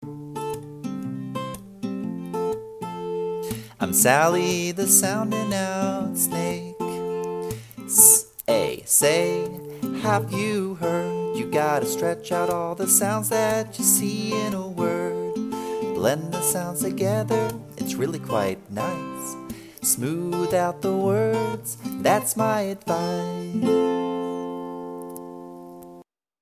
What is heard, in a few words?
Sally Snake Vocal